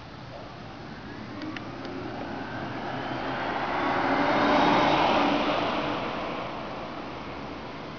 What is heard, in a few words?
exterior; charge across america